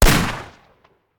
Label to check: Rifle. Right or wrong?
Right